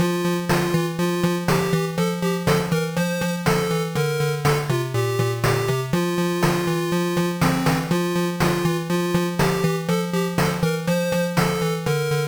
Forest Green, Traditional, Tandy 1000; lemmings

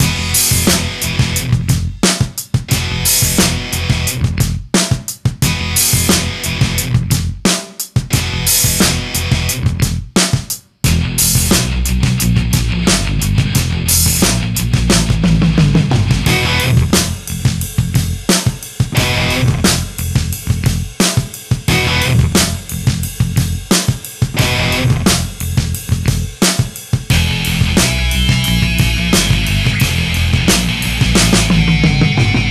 Track Loading; Fuel

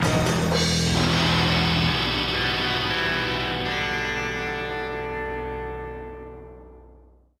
Action END

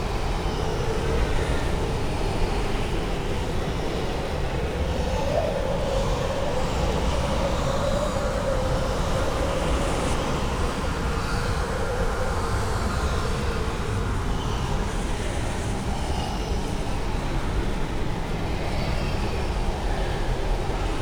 Ambient

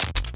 Bee Zap; Lethal Company

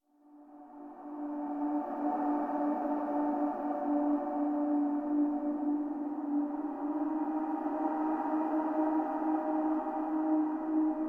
Jungle Rhythm Whisper Pad; Far Cry

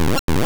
Bite; sfx; attack moves; pokemon; gen 2; gsc

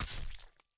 Attack Ironball

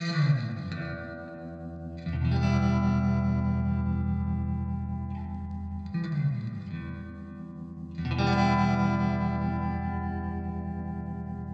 Western Guitar

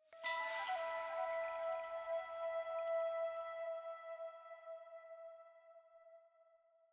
item Jingle Flute+ Chime E

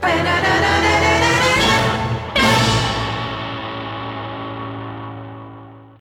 1st Place Goal Fanfare; crash bandicoot series sound box; Track and the Beanstalk

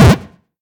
Hit Leader; Pac-Man Championship Edition 2 Plus

